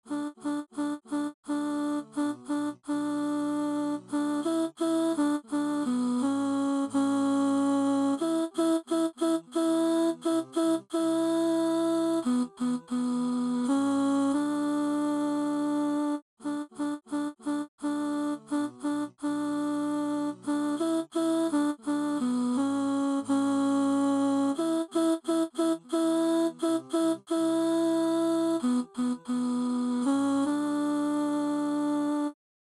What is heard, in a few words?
Alto